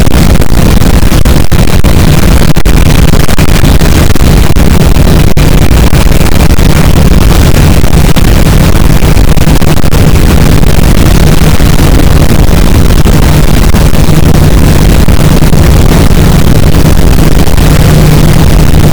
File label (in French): cachalot negatif, boat, Physeter Macrocephalus